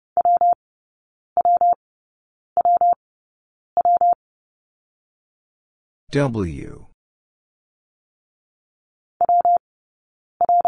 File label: Letter w - Introduction 30wpm, morsecode